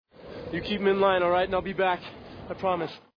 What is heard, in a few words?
transition; eight below